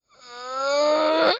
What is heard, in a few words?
swordman die; megaglest